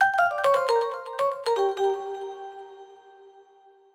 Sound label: 120 C Vibraphone